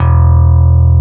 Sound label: BASS E1; E-MU Formula 4000 Series Vol. 5; Protozoa; Proteus1 Instrmt